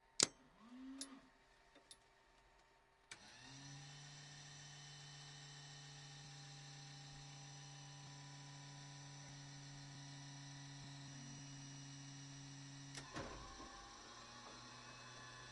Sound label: grumman f6f hellcat onboard wild foley on dashboard; sonniss gdc